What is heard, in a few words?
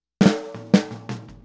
Boogaloo Snare, 82 BPM